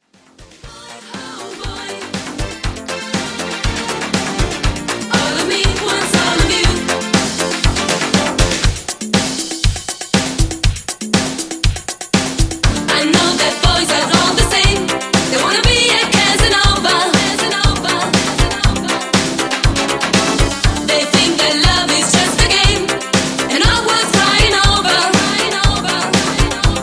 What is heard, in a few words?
80s Extended Redrum 120 Bpm